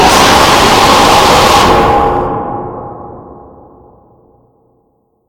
jumpscare sound; five nights at hanwils r

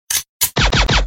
pistol; roblox intro

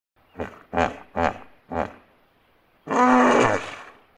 hippo sound effect

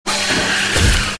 jumpscare; bunzo

